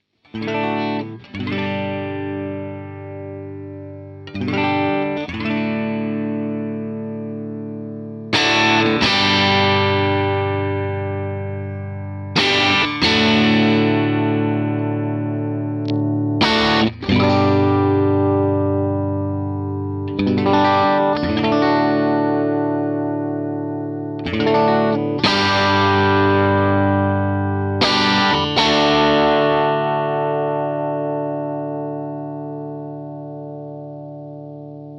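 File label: Nighthawk Samples Rhythm Tele FStrat Sound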